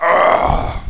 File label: pain; ratamahatta